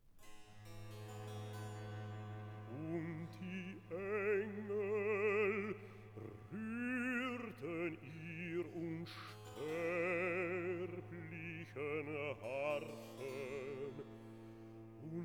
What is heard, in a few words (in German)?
Rezitativ; Und die Engel rührten ihr' unsterblichen Harfen; Haydn; Die Schöpfung